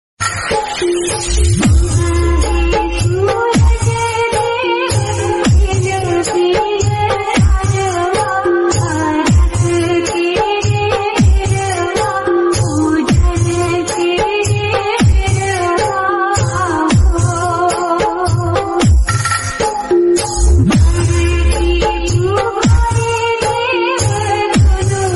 Bhojpuri Dj Remix Ringtone; Happy Chhath Puja

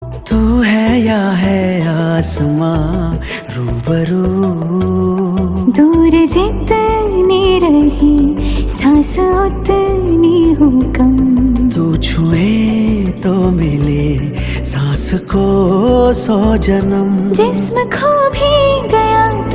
Ringtone; Martin Movie

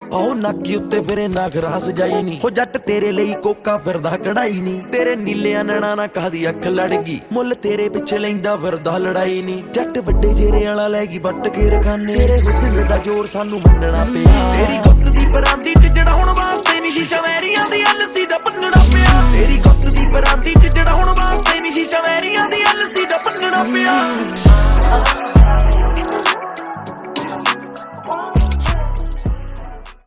Ringtone

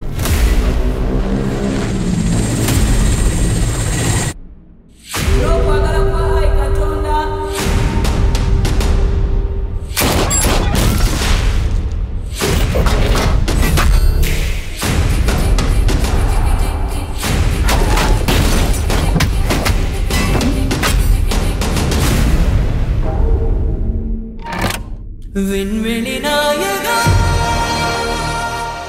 Teaser Bgm Tamil Ringtone; Thug Life; Kamal Haasan

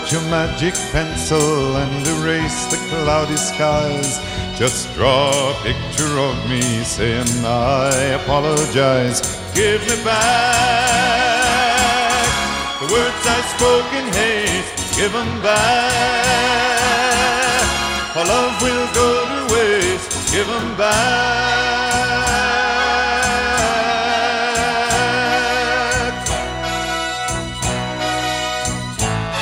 Ringtone

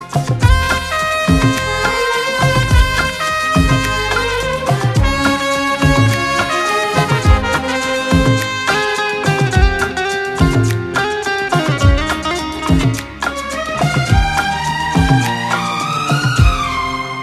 Instrumental Ringtone

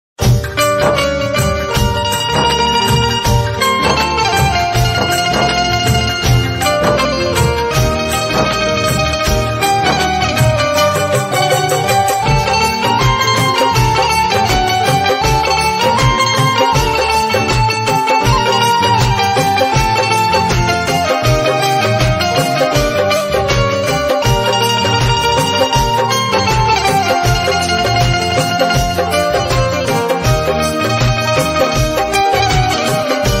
Desh Bhakti Instrumental Ringtone; Karma

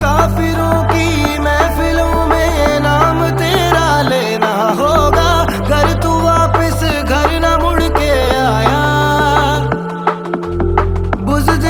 Song Ringtone

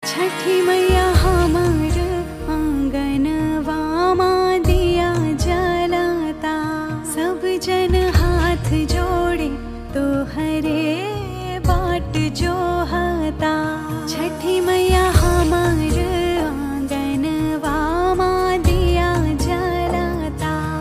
Song Ringtone

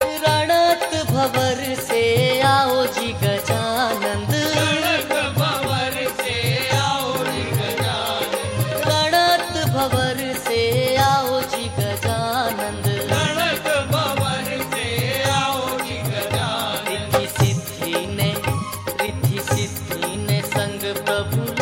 Bhajan Ringtone; Ganesh Ji

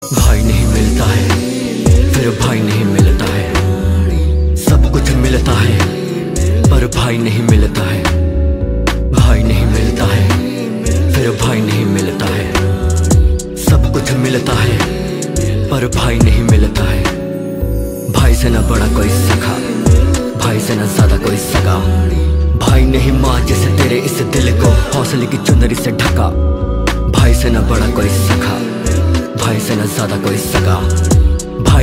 Hindi Rap Ringtone

